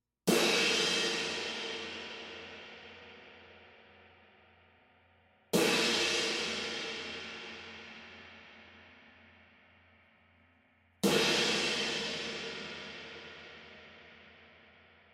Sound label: Epic Crash